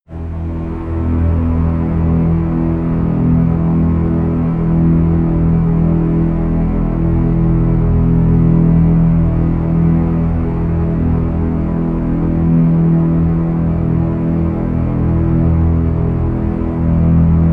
drone simple double bass

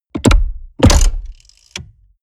mechanics large lock; industrie-wirtschaft; mechanik

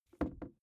briefcase plastic creaky inside pocket; industrie wirtschaft; bueroarbeit

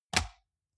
metal device click lock in; industrie-wirtschaft

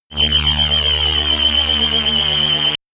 shot annoying tooth low; zaps buzzes

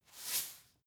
salt tilt

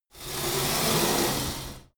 pullup machine cable release fast, fitnessstudio

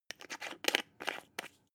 glass pepper cap screw down; soundpool

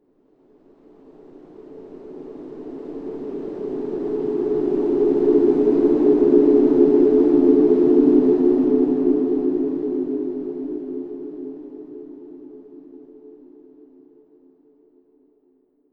windy, music elements; flaechen

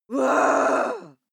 female aggressive scream low

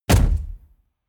explosion solid impact; explosionen; comedy-cartoon; soundpool

